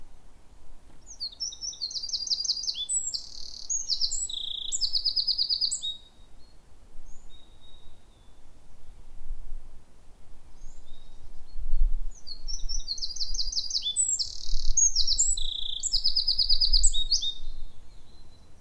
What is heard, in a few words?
Eurasian Wren Troglodytes troglodytes